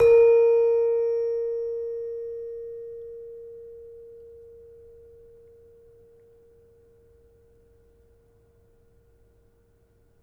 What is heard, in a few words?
Demung, gamelan; gravityharps; timbrebox